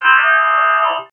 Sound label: voc, Vocal House Samples vocal fx; PS-28 Vocal House Samples